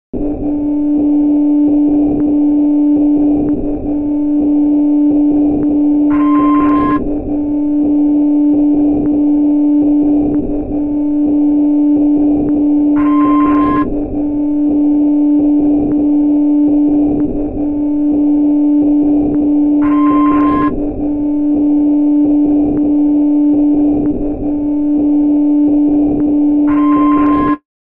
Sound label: radio; Milk outside a bag of milk... OST (2021)